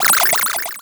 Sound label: Digital; Adobe; Multimedia Internet CD-Rom Flash